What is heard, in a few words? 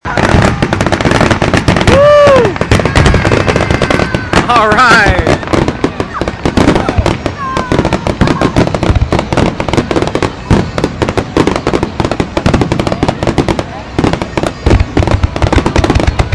rhythm booms